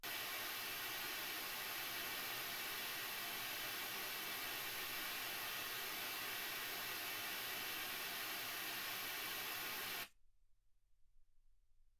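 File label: white gaussian noise 48kHz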